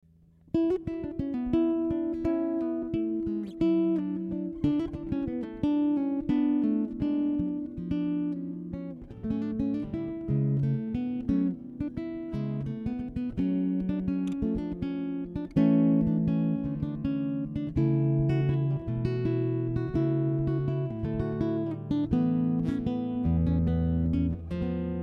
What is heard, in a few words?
Prelude A Major; ponce; solo